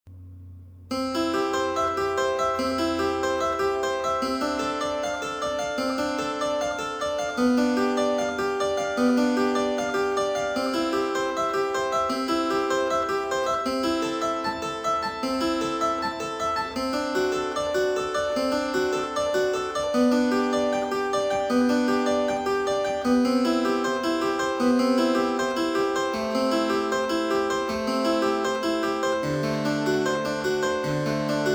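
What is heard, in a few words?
prelude C major, Flemish harpsichord; historical instruments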